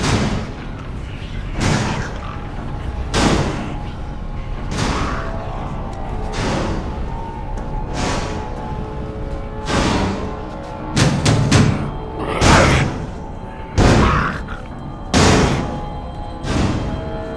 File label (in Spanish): golpeando puerta